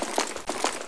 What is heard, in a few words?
spas12 idle